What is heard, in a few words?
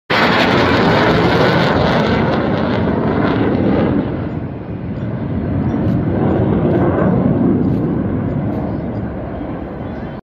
MiG-29, جنگنده میگ 29